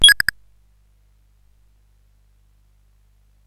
sid CSG8580R5, GAMES; Card Sharks

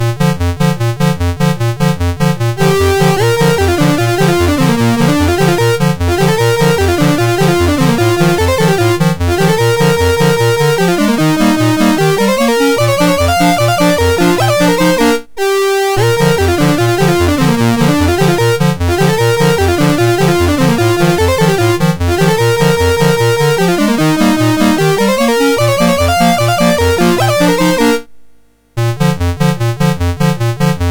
MOS6581

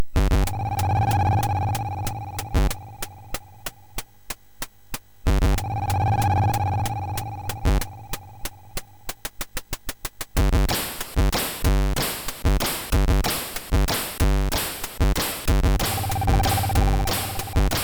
sid, MOS6581R3; Twisterbeat

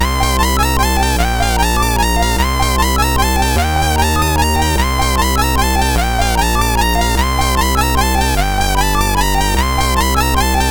Guffaw, sid MOS6581R3; hvsc

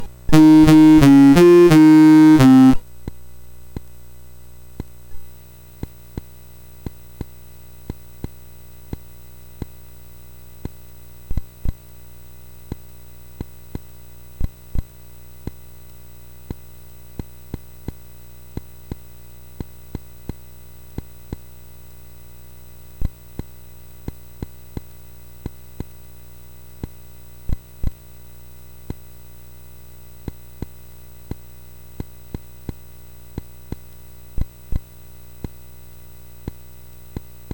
sid MOS6581R4, GAMES; Break Dance

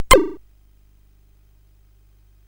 Tecnetium, CSG8580R5

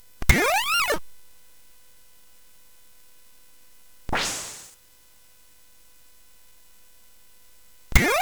Sound Fx, CSG8580; hvsc

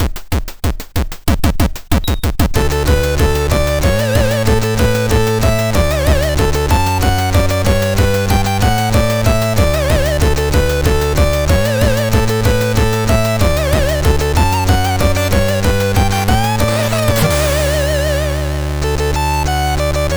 Happy Birthday, sid MOS6581R4

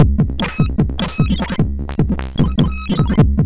Break, MOS6581R4

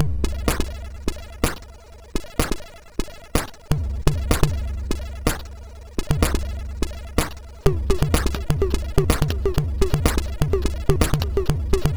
House Sound sid MOS6581R2; hvsc

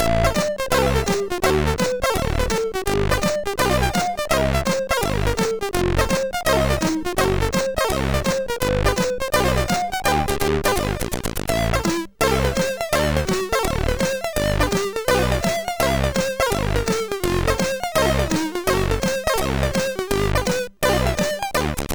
Jazz sid MOS6581R3

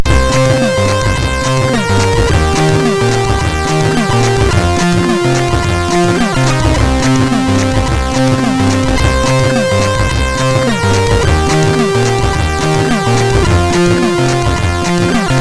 Old Music, MOS6581R2

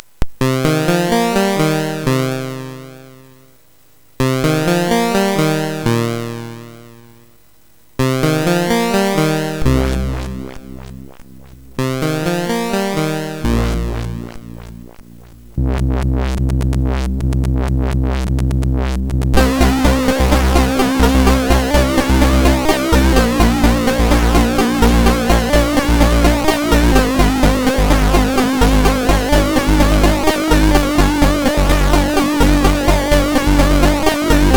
sid, MOS6581R4

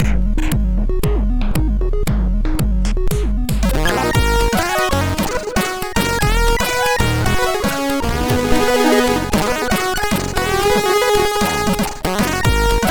sid MOS6581R2; Lizard; Five Hours

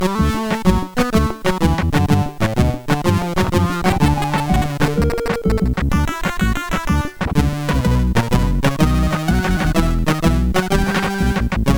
Intro, MOS6581R4; hvsc

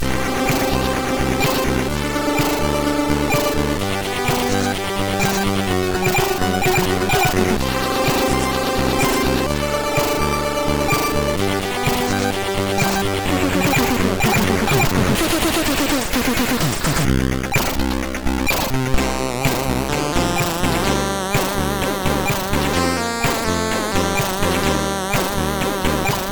Drums intro, sid, MOS6581R3

